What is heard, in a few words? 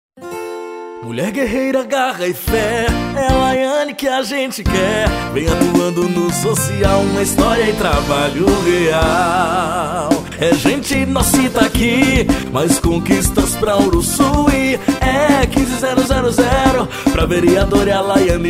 Jingle